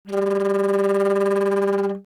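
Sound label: alto flute G4; dsp2008 sound library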